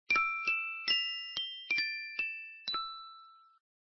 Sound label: Respiration